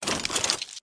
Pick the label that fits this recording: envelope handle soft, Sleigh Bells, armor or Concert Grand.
armor